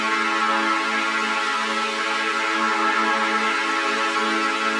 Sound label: Pad Loop A, shimmer and sparkle